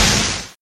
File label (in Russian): usilennaya i modificirovannaya vinilovaya zapis belogo shuma